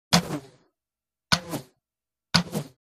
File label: Non-punctured Long bolt Crossbow Arrow: By; Three Very Fast Arrow Bys Shot From Crossbow. Smooth, Low Pitched Bys. Close Perspective.